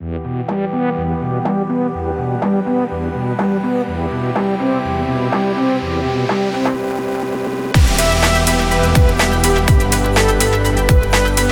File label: futuristic technology dreams